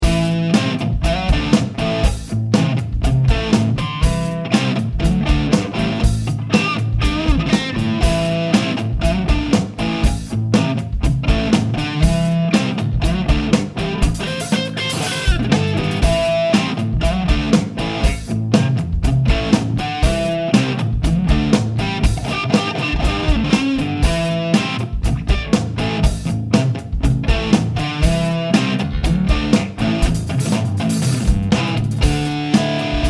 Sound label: Crunch 6 string Neck